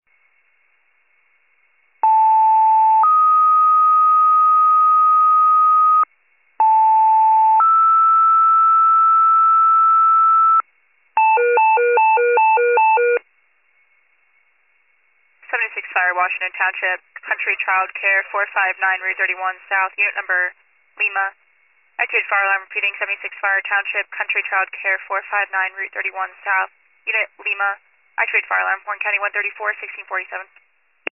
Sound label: fire, pager alert